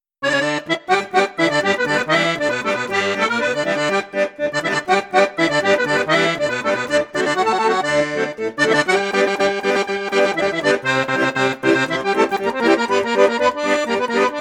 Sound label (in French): registre basson basson octave 3 flutes, accordéon; accordiola